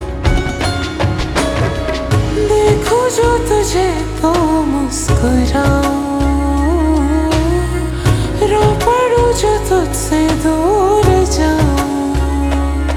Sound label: Slow Reverb Version Ringtone